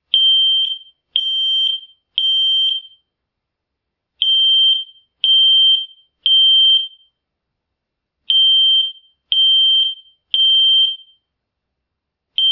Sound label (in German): Rauchwarnmelederalarm